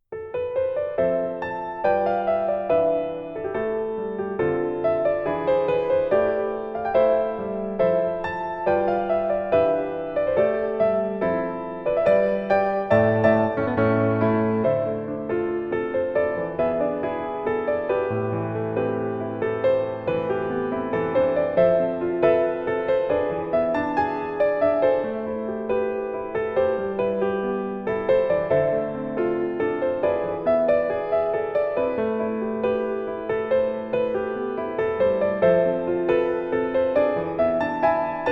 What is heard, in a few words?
Boss - Hot Blood (Piano Ver); La Tale